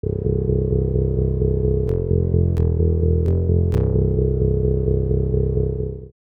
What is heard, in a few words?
bass